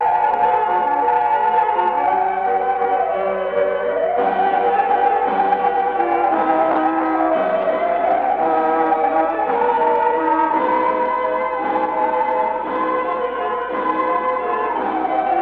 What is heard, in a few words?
Saw and Calliope Organ on Wire